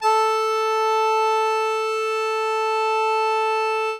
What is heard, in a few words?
Accordion A3